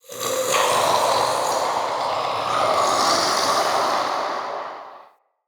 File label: Male Vocal Idle, Enemy; Characters; Mockeries